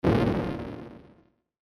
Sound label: Burst Electrical